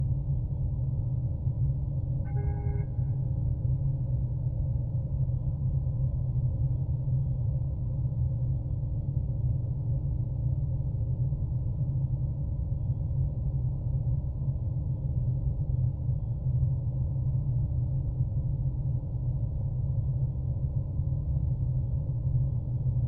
Station Deep Ambience

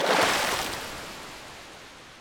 Impact Liquid Water Fall Body Reverb Tail

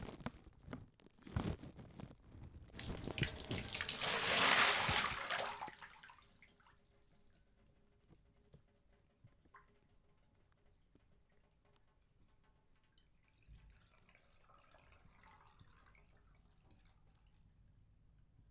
Water

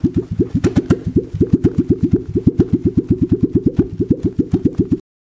Flimsy Wobble

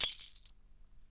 Keys Drop

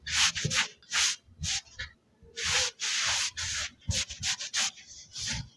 Wipe And Scrub

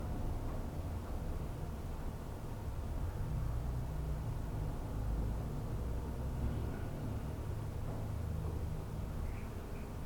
Room Tone Living

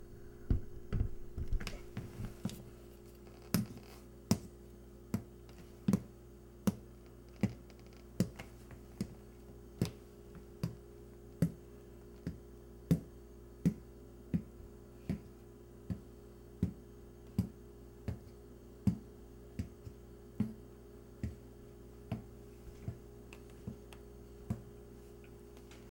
Foot Steps

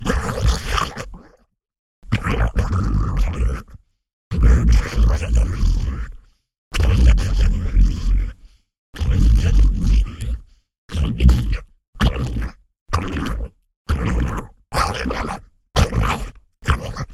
Disgusting Bile Filled Monster

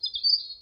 Bird Tweet

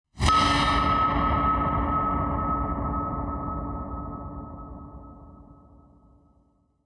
Dramatic Metal Clang Reverb 10 sec

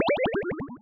Retro Death

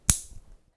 Cutting Nail

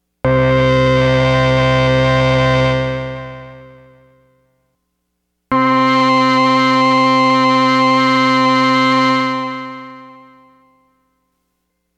Electric City

